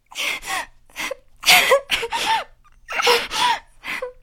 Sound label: crying